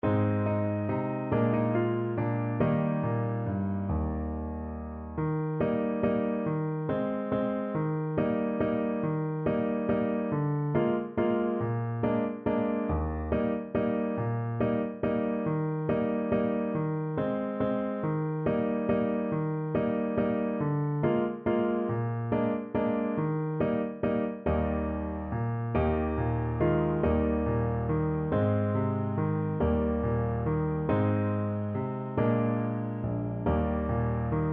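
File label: e papa waiari, alto saxophone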